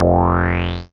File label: bass F1, EMS VCS3; Polyend; Simulacrum Sounds; 1 Shots; Synths